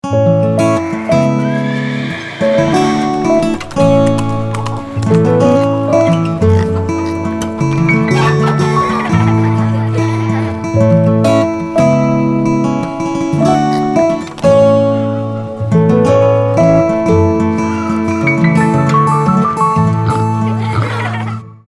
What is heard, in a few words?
Ringtone; Averbeck; Erwachsenen-Version; Landhaus Averbeck